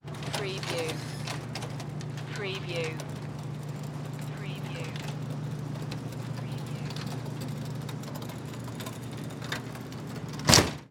Cargo Door Close Auto

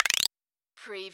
CARTOON CLICKS PITCH UP

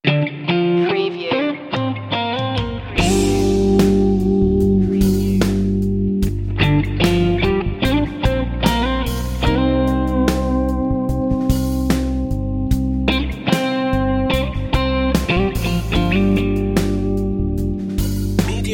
strings and melodies

